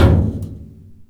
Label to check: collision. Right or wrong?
Right